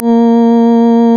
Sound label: ORGAN, A#; Big Fish Audio; Synclav FM